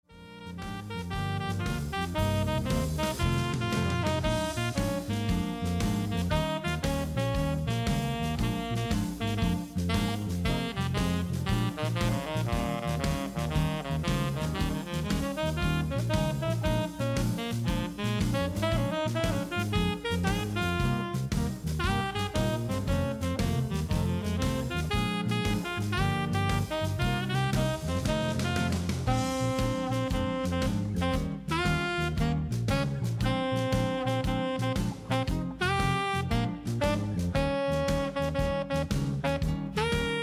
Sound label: Blues